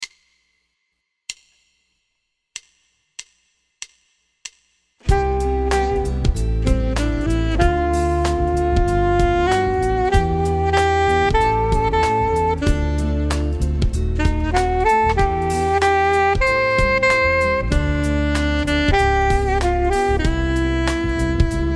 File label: Technicolor Playalong